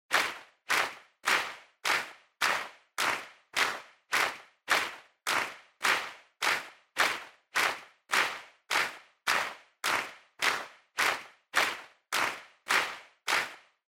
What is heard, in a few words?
Handclap Mid